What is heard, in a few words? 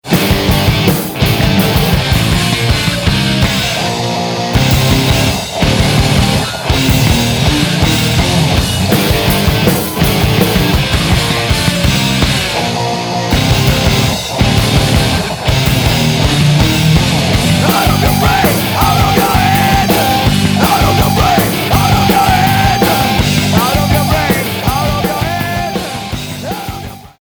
Music